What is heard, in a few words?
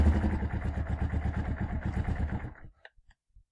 stop Generator; Zomboid 41.78.16; Project Zomboid